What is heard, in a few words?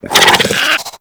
Infested Biped Alert; Warframe